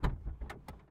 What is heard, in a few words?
ferrari f40 lm door open interior; dpa4021; Sonniss GDC